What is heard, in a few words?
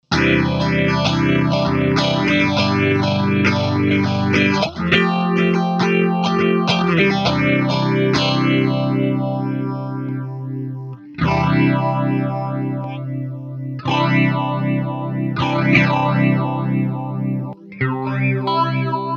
Phaser